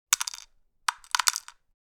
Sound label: Pill container putting pills inside; medicine